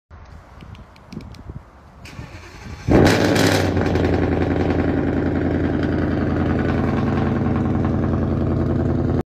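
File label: Honda Civic, exhaust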